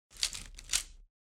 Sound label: Reading bow